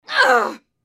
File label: female death